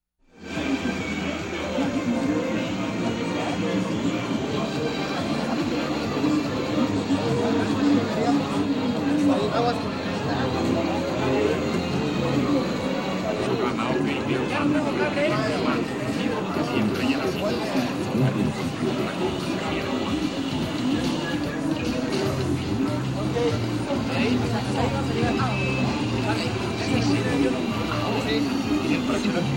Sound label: Pub street